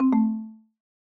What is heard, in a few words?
close Mic